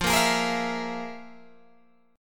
F Minor Major 7th Double Flat 5th, Guitar, Open G

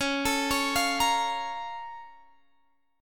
A sharp Minor Major 7th

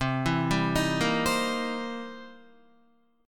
C 7th Sharp 9th C Guitar; arpeggiated; JGuitar

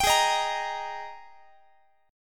G sharp Major 7th Suspended 2nd, Guitar; G#M7sus2 Chord

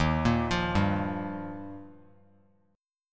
Eb Major Add 9th; Ebadd9 arpeggiated; JGuitar

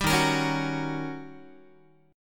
Eb 7th Sharp 9th Eb, Guitar; strummed; 10,11,13,11,10,x; 4A,4E,5A,5D,5Gsharp,6Csharp

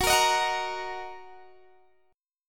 F sharp 6th, down, Guitar, DADGAD

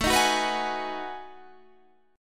B 13th A, chord

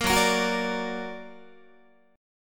F sharp Augmented F sharp Guitar; strummed; 4A,4E,5A,5D,5G#,6C#; 13,14,13,16,14,13; JGuitar

